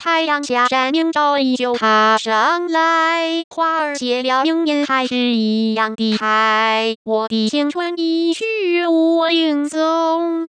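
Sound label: synthetic singing voice, 女聲, smoothed; 使用頻譜包絡內插之國語歌聲合成 (Mandarin Singing Voice Synthesis Using Spectral Envelope Interpolation)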